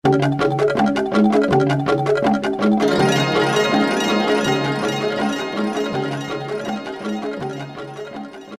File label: MusicaLoop, Korg N364; Factory Prog demos